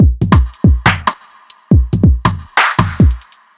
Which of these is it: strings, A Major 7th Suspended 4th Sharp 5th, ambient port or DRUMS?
DRUMS